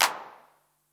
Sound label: Clap